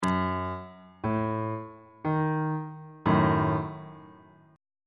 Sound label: d sharp diminished 1st inversion on bass clef